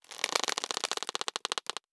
Rope; Survival Game Sounds - Lite Edition

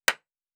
Nail Wood; Survival Game Sounds - Lite Edition; Crafting

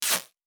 Tape; Survival Game Sounds - Lite Edition; Objects and Items

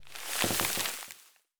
Tree Falling; Survival Game Sounds - Lite Edition; Environment

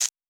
Electricity; Survival Game Sounds - Lite Edition

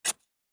Soil Impact; Survival Game Sounds - Lite Edition; Environment

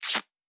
Tape; Survival Game Sounds - Lite Edition

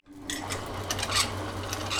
Engine Start; Survival Game Sounds - Lite Edition

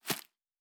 Leaf Run Surface, Footsteps

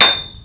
Pick Hit, Shatter Stone; NV3D

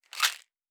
Pills; Survival Game Sounds - Lite Edition; Objects and Items

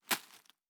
Leaf Walk Surface, Footsteps

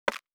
UI Simple, Interface; Survival Game Sounds - Lite Edition

